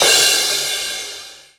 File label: hitsound percussion